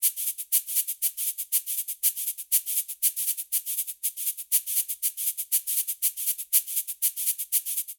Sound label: Samba 1 Cabasa 120bpm; Latin Percussion; Ableton; Factory Packs